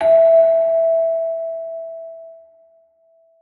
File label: Long Bell E